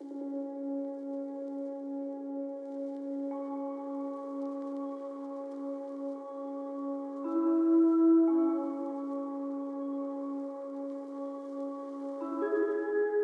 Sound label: 145 BPM C# Min Pad, melody loop stem; Trap; Loop Stems; Cymatics; 2022 Melody Collection; Ferrari